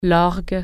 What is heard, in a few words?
organ